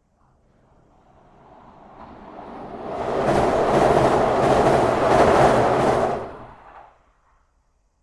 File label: under a railbridge